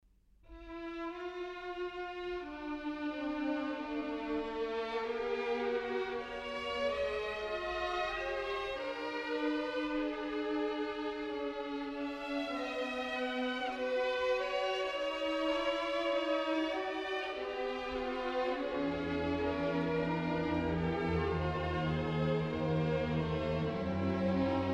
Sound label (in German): Bariton-solo; Lenin; 'Jetzt weiss ich, dass er gestorben ist'